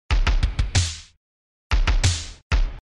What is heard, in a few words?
kickbeat slow